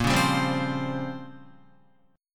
Bb Minor 6th Add 9th Bb down Guitar; strummed; JGuitar

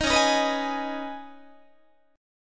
C sharp 7th Suspended 2nd C sharp down Guitar; C#7sus2; strummed; JGuitar

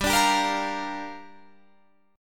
Bb 6th G

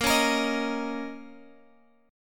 Bb Minor Double Flat 5th, Guitar, Open G; 20,18,20,20,x,x

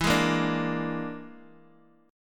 E 7th Flat 5th, down, Bass Standard; E7b5 strummed; JGuitar

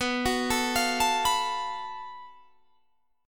Gb Minor Major 7th Double Flat 5th B

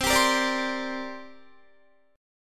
C 7th Suspended 2nd C down Guitar; C7sus2 strummed

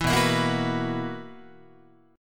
B Major 7th Suspended 4th B, Guitar; BM7sus4; strummed

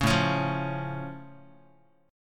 A Augmented A down Guitar; strummed; 4,5,4,3,x,x; JGuitar

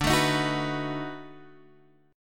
B 7th D; B7/D strummed; JGuitar

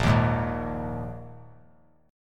Bb 7th Suspended 4th Bb 3C,3F,3A,3D; strummed; JGuitar

